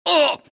pain; tinker

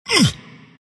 embr pain; dota; ember spirit

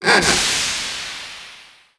goo cast layer; weapons; dota; bristlebog

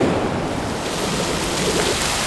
ability pre geyser; kunkka

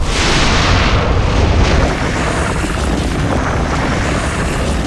meteor; weapons; dota; invoker

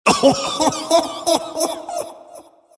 crown laugh; dota; monkey king

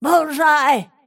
last hit pain; snapfire

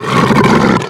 death ogre; dota; creep deaths

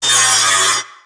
tango cut; weapons; dota; shredder

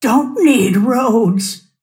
move pain; snapfire

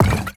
jug equip, inventory

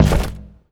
inv delete; ui; inventory

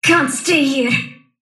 sylph move pain; dota; dark willow

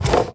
wood pickup; ui; inventory

